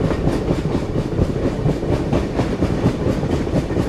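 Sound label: Ambient locomotive moving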